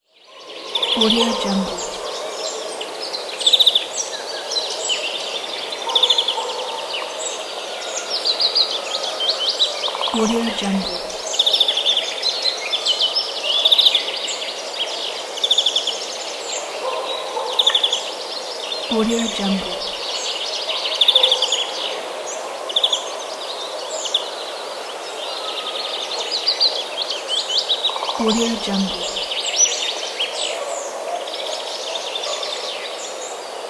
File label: forest ambience near village